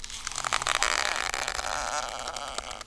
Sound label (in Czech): aerostat; Mafie 1